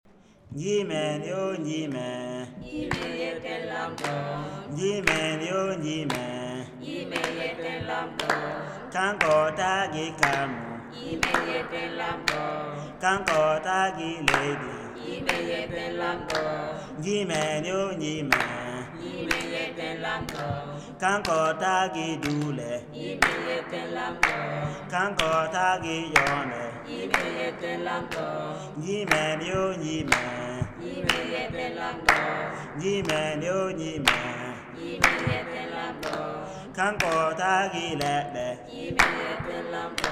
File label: Fulfulde Northeastern Burkina Faso Creation Song; Tageefo